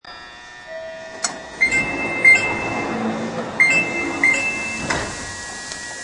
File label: open, door